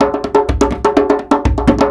Percussion Loops, Bongo & Conga; Houseworx

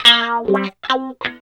GTR

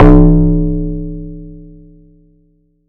Perc

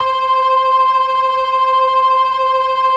LO MANUAL VIB, Organ

